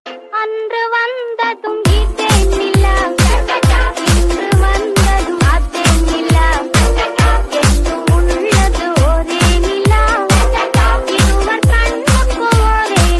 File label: trap remix english ringtone